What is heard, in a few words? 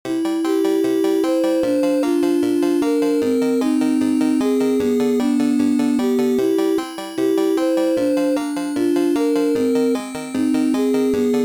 bgm brick dungeon